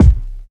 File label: boom bass drum sound a sharp key; kick drum samples